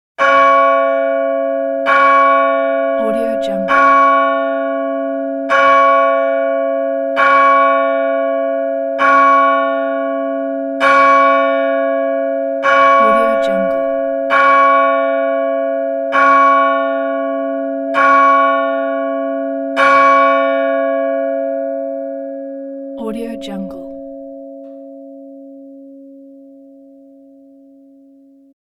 12 hard strikes of large c church bell

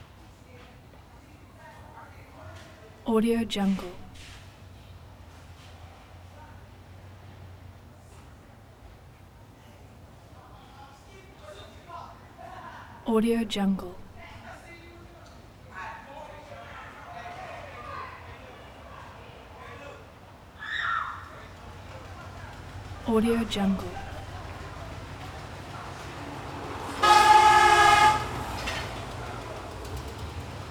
city night ambience; prosoundfx

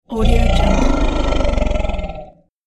menacing growl

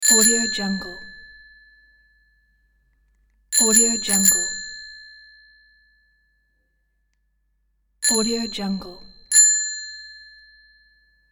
bicycle bell; dastaudio

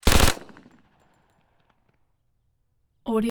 gun shot thompson m1 fast triple fire

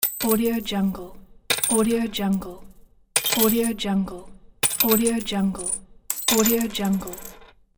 coins drop

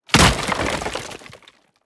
destruction wood impact small

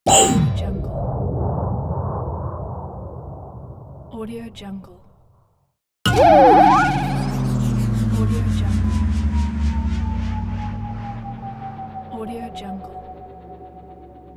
impact action ui

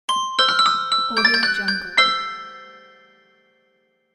toy logo

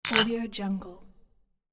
three bottles clink together

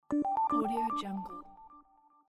positive intro

